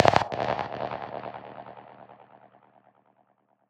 Squelch G 140 BPM, Psytrance; Ghosthack; Psytrance Visions Volume 2

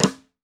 Brass Trap Flam, Snares; Drums; Ghosthack - Free Snares 2023; Blk Brass

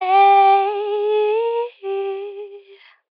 Ad Lib Dry - C Min, vocals; Cymatics - Eternity Sample Pack; Ad Libs

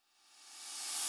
Drum Loop - 150 BPM Cymbal; Loop Stems; Cymatics; DOPE DRUMS VOL. 1; Worlds

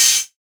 Open Hihat; Drum One Shots; Cymbals

